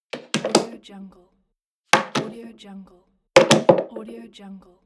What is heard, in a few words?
gun put down impact